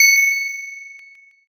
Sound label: handbell